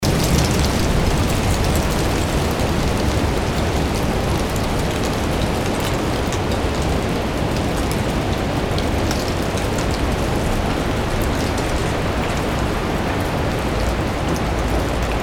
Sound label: rain and wind